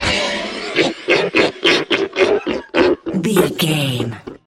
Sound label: troll monster laugh high